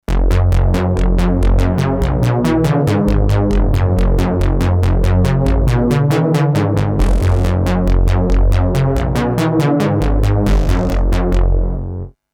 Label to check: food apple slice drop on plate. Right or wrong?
Wrong